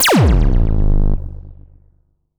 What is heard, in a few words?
Lazers